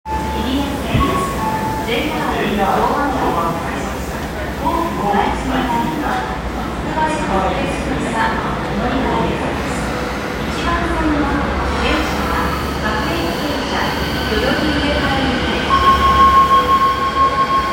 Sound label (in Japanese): 千代田線北千住南 小田急の列車, train sounds